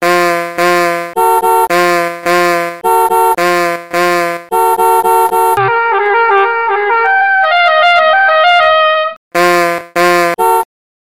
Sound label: Alarm Tone